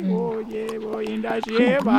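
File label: Music Village Calm Inside; from dust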